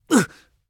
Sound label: Damage; ZeeJay